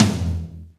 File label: tom Rock lo; drumkits; hydrogen; GMkit